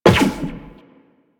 Step Zipline, Footsteps; Walk; Warframe; Tenno

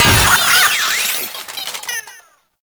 Infested Cat Loot Crate Destroy; Warframe; Nineteeen Ninety Nine; Cat Loot Crate Common

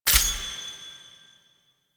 UI Mod Install; Tennokai; DE; Warframe; Mods

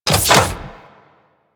Small Door Open; Crp Gas City; Corpus

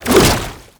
Infested Hammer Idle Whoosh, Weapons

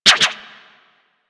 Shield Deflect; Warframe; Hayden

